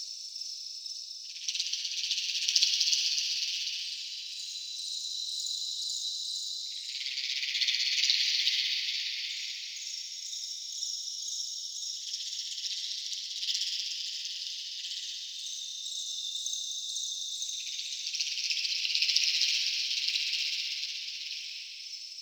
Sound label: Infested Eris Interior Caves Bog Loop